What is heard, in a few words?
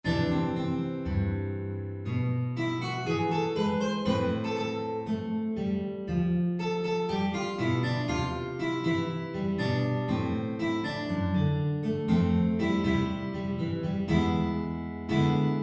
sologuitar